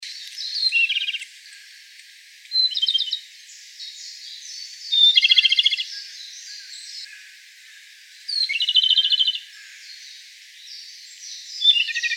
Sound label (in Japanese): komadori